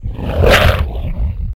attack, abomination; Fate The Cursed King; MONSTERS

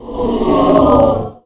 anvil fail; Fate The Cursed King; ITEMS

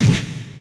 slash; Monster girl quest Part1,2,3; Visual Novels

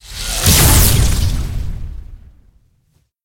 spin spark; Fate The Cursed King; SPELLS

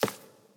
footsteps hewn; Fate The Cursed King

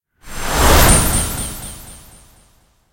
flying disc, spells; Fate The Cursed King

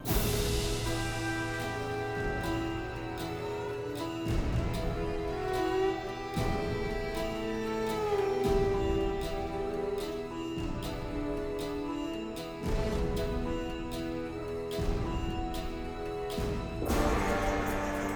dungeon; Fate The Cursed King

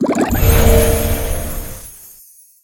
potion use heal; Fate Undiscovered Realms; ITEMS

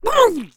attacked, Mud Octopus; Fate The Cursed King; MONSTERS

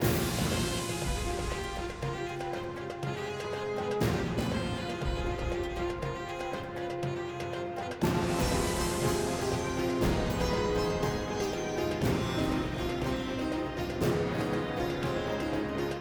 dungeon stinger; Fate The Cursed King

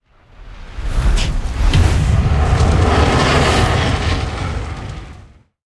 Dragon, spells; Fate The Traitor Soul

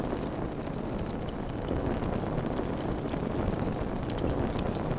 torch; Fate Undiscovered Realms

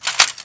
light metal drop; Fate Undiscovered Realms; ITEMS

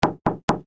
knock; Monster girl quest Part1,2,3; Visual Novels

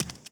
bare step; Fate The Cursed King

